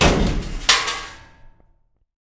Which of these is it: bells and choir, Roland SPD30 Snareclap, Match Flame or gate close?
gate close